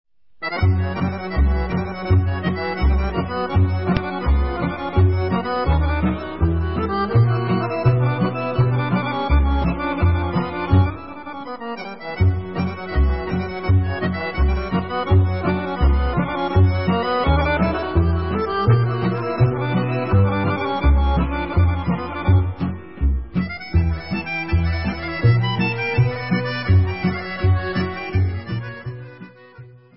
Kolo Serbian

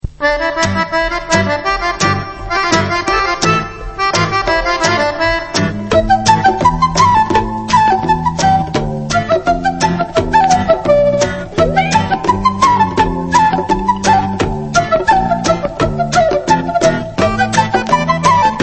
Debka Instrumental

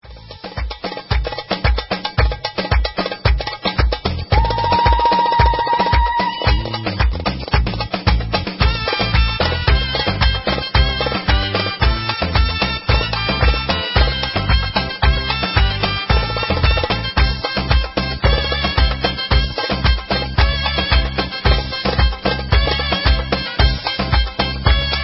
Instrumental

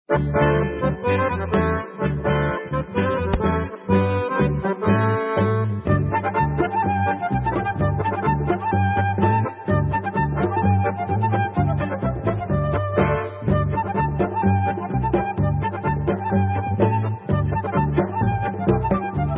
Debka Instrumental

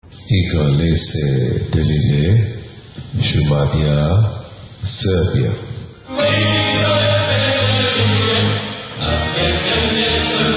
Serbian; Igrale Se Delije

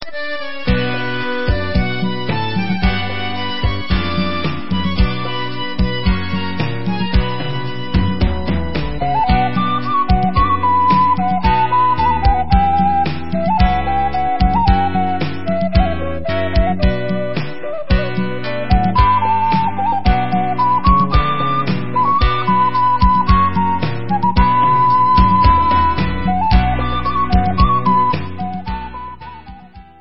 Instrumental

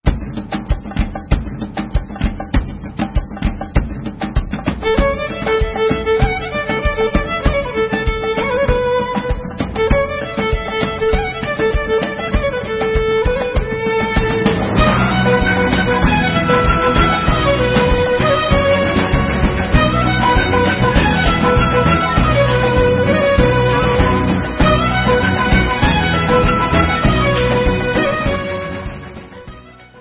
Instrumental

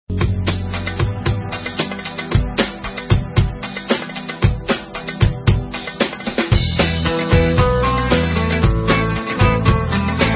Instrumental

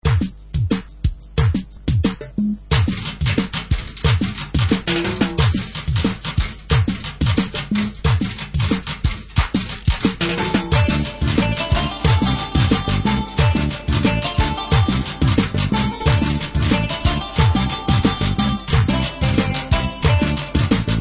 Instrumental

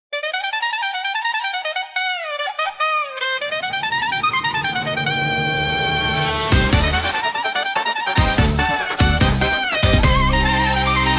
Instrumental

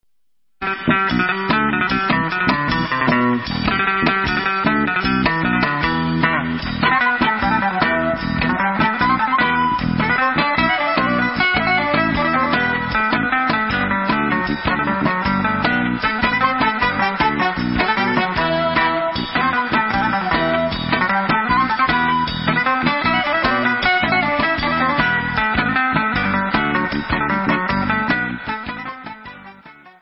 Instrumental

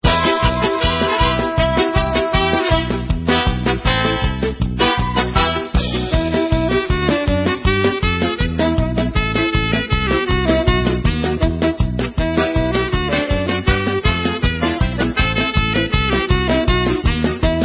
Hora Instrumental

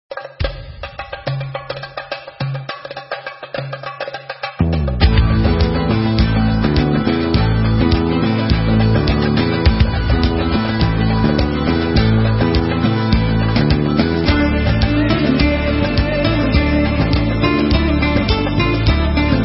Instrumental

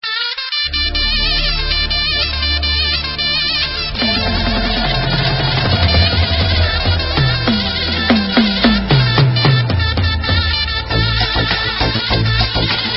Debka Instrumental

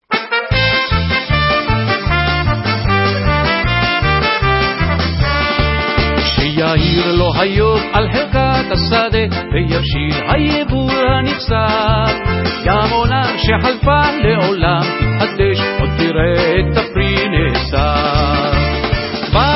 Hora Vocal

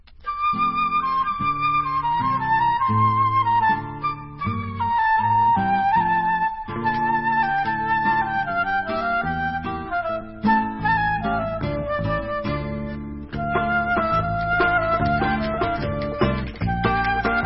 Instrumental

